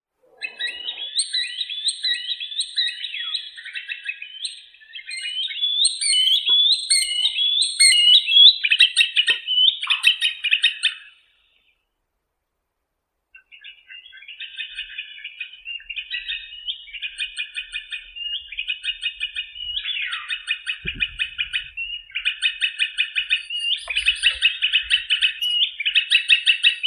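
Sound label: green sandpiper, tringa ochropus; chernish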